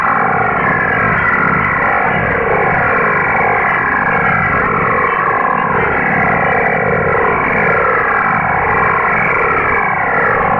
Unknown ALE Sound

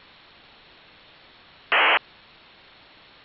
4g lsu conf

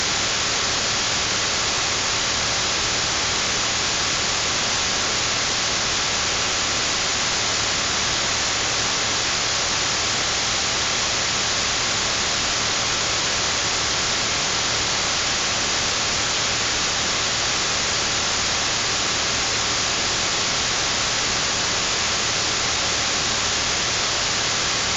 LTE420 sound

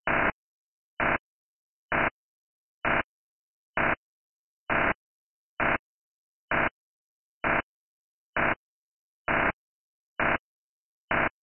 Possible MOTOTRBO DMR resting burst

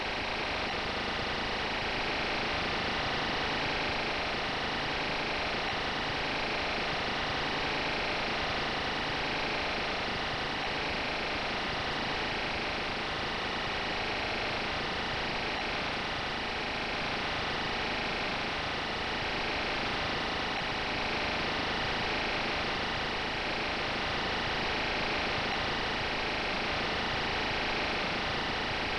P25 CQPSK CC IF

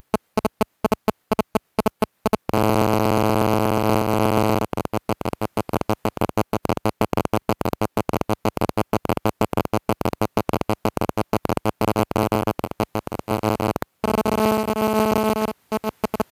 GSM AMR HR

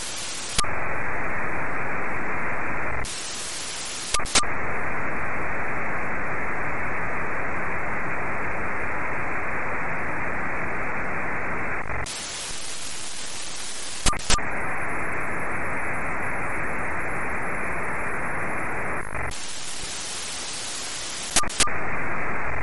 P25 Sound

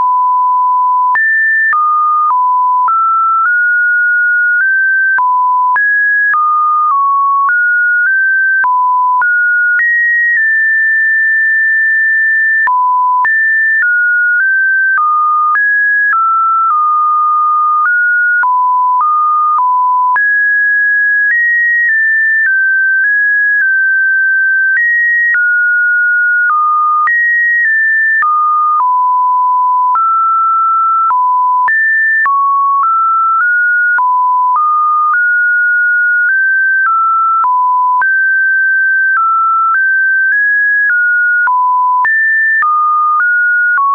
JT9G; JT9G, from WSJT-X with default 1500Hz tone setting

